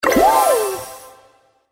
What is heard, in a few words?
explosion; Candy Bona